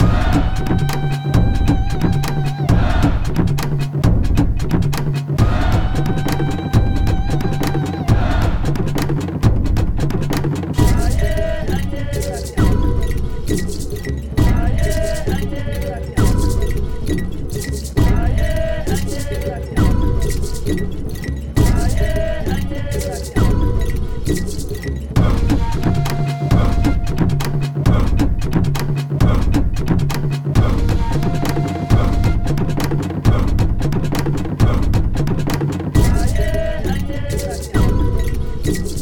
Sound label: Atzec Chant Vocal; Black & White 2